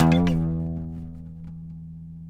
PLK OUD; Roland - LA Composer vol. 3

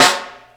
Jungle Snare, Snare One-Shots; KJ Sawka; Drum n Bass & Beyond; Drum Tool Box

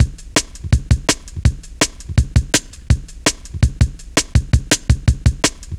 Tin, Jungle, Drum Loops 165.5; Zero-G Jungle Warfare 1, 2 & 3

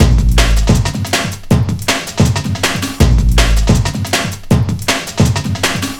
160, Drum Loops; Total Drum&Bass

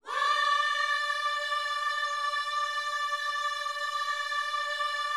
WHOA D 5, Gospel; Spectrasonics Vocal Planet

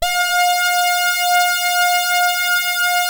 SAW RES WET, SYNTH

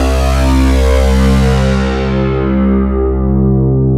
THICK SYN

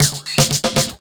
Fill, Drum N Bass Percussion Fills n Breaks; Sonic Foundry (Sony Creative Software); Knutrix Drum & Bass

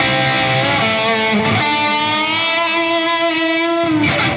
110bpm, 80s heat samples; musicradar

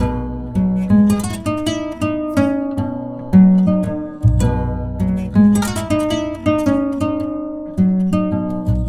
KORA

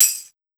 HARD 130 TMB, Big Beat Drumloops; USB Soundscan vol.25; AKAI

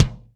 TEK K, TECHNO, Drum Base; 90 sSampleCDs; Crypton - DrumBase5000

